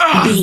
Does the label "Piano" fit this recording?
No